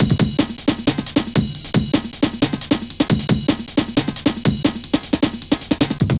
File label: Sub Urban, programmed loops; Zero-G Jungle Warfare 1, 2 & 3